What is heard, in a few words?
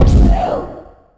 smite; Player FX